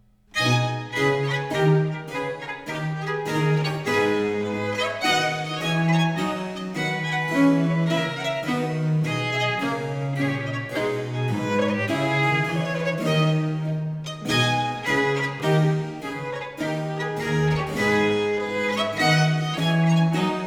Ayres for the Violin, Suite in A Major, Jigg - Allegro; Book 4; Italian Baroque Violin Sonatas; Nicola Matteis; Ayres for the Violin, Vol.1; 尼古拉斯 · 麦克吉根